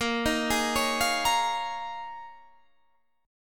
Eb 9th Suspended 4th

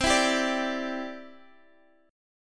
E Minor 7th Sharp 5th C

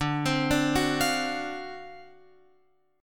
D 7th Sharp 9th D, Guitar Open F; D7#9 arpeggiated

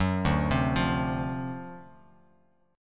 D 7th Sharp 9th, Bass Standard; 14,5,10,10; arpeggiated; JGuitar

